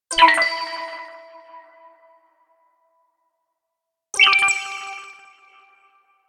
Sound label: Tweak Blink